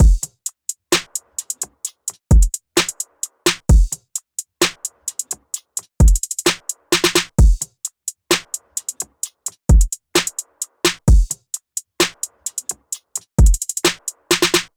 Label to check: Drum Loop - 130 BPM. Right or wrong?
Right